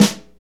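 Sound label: SNR snare, Motown; Northstar; Drumscapes Roland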